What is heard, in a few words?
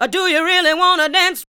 ADLIBS 90; Ueberschall; Jam Box